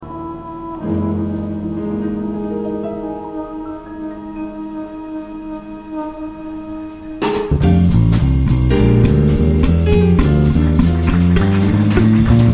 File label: Bass Ballad Blues